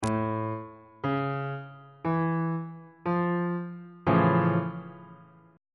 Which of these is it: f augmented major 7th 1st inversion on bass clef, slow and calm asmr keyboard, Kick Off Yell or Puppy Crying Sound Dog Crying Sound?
f augmented major 7th 1st inversion on bass clef